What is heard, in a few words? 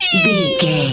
mouse emote